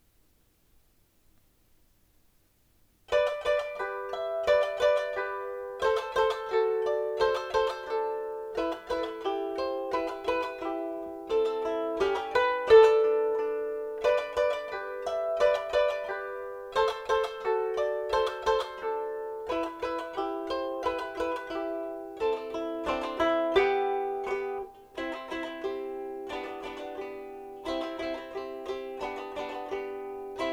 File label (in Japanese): ツクツクテン